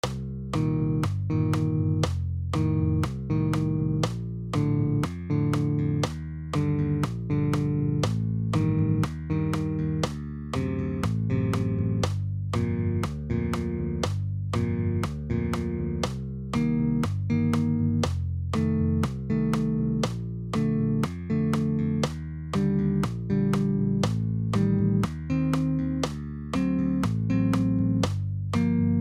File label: chord